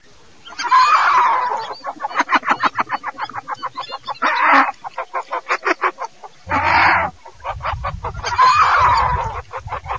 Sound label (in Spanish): PICAZO